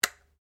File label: RIFLE ANSCHUTZ 67MSR 22 CALIBRE SAFETY ON; A selection of domesttic firearms; Rifles; Guns